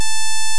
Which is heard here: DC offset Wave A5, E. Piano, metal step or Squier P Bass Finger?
DC offset Wave A5